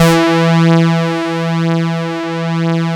BASIC OB E3, OB Synths, Analog; E-MU Formula 4000 Series Vol. 3; Analog Odyssey

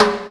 TIMBALE; E-MU Formula 4000 Series Vol. 5; Protozoa; Orbit Instrmt

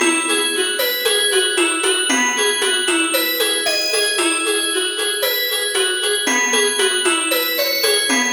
E Seq Digital Toy Piano; Zero-G; Future Wave

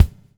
BUBL, BUBBLE; Spectrasonics - Backbeat

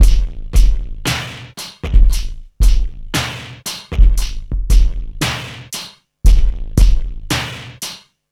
FX kit, beats; Sonic Foundry (Sony Creative Software); World Pop

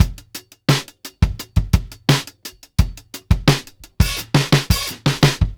86, Beat Loops; Zero-G; Monster Beats

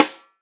REAL DRUMS, POP KIT; Best Service ProSamples vol.43 - Real Drum Kits

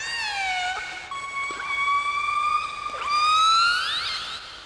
ANIML; Zero G; Man Machine

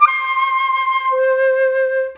Flute, Orchestral Series Rock and Pop

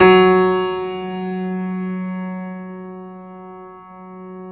PED FF F, GRAND PED; Best Service Advanced Media Trax Modern Composer

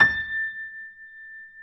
Keyboards Acoustic, GRAND P; USB Soundscan vol.17

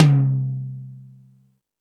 Toms, E-MU Pro-Cussion

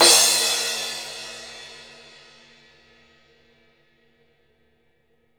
CRASH; Sampleheads - New York City Drumworks VOL-1

